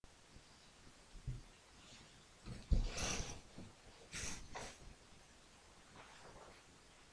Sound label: camera